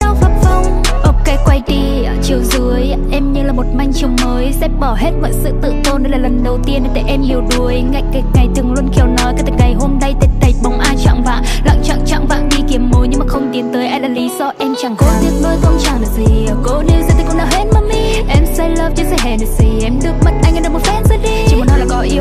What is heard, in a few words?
rap tiktok